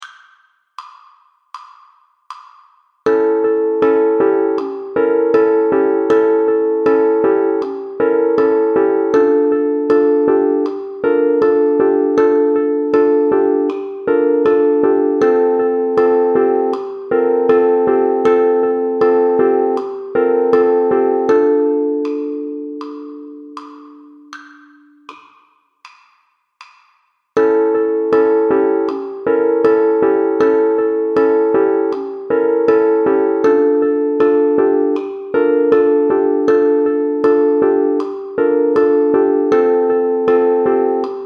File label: 80bpm Intro 2 Ukes Chords and Melody